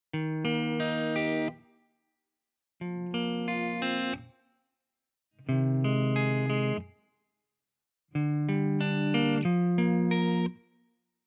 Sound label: free les paul; chiqui audio